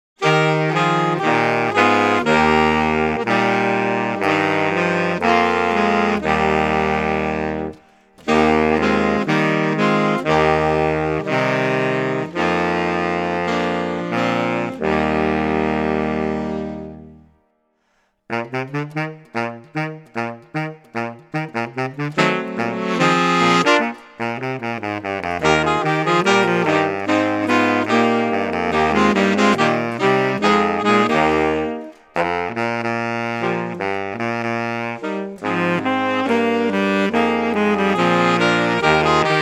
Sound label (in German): Froehliche Weihnacht Saxquartett; Arr. R. Hechensteiner